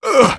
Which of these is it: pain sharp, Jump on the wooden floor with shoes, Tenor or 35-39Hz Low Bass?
pain sharp